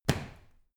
medicine ball catch, fitnessstudio

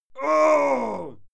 male fight scream pain; soundpool

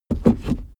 suv change reverse gear fast

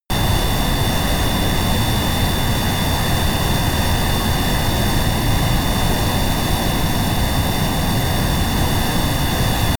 plane boeing 787 turbine full power close; flugzeuge verkehrsflugzeuge; verkehr transport

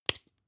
spice jar small open; industrie wirtschaft; gastronomie

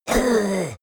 leprechaun gasp hit; koerpergeraeusche; comedy-cartoon; soundpool

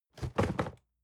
cardboard box medium fall low soft; objekte

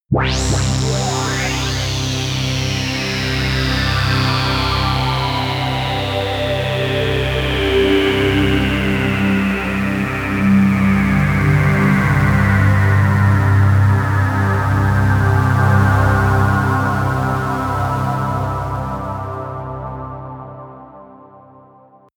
pad sweep buzz; flaechen

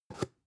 spice jar big scratch open fast

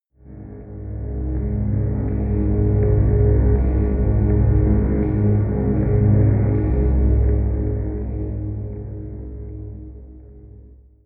deep atmospheric drone rhythmic; musikelemente; drones

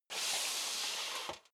step leather shoes metal dry slide long; schritte

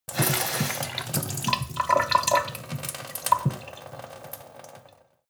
sink water pot medium

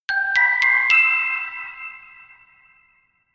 notification glockenspiel creepy sequence ascend

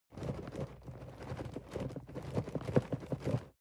cardboard box medium shake soft; objekte

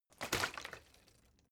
bottle water sparse shake single soft; berufe

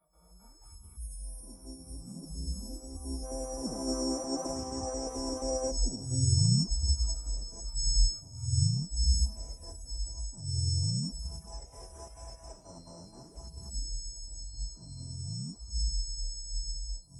space alien communication attempt; aliens; soundpool

